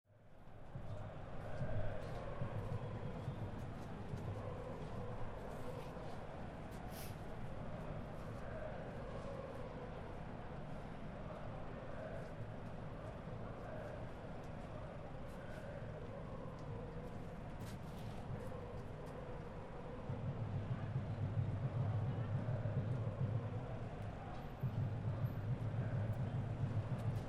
football game; ostseestadion

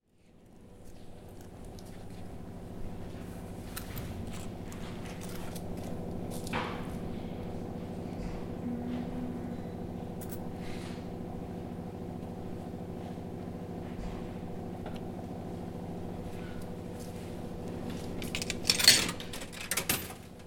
university libary coffee machine; sudstadt

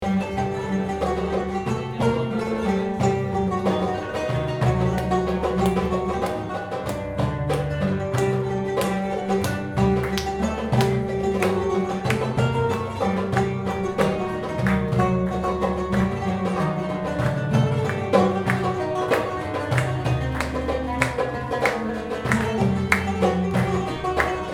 music from syria

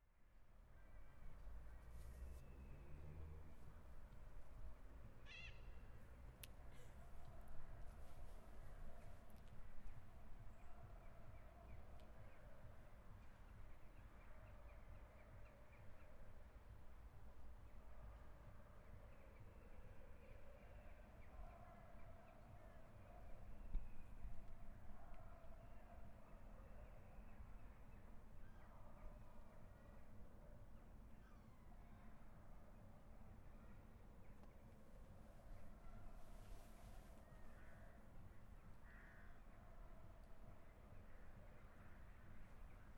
zoo football fans heard long

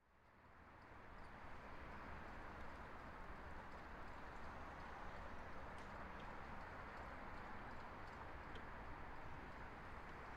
habour bike and seagull

